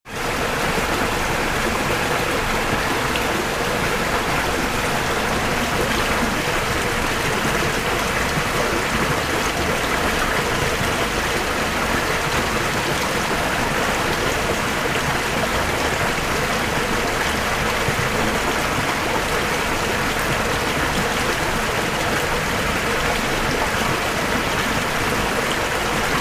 Howling Wind Interior Point of View, Eerie Low Hum.; eerie & gusts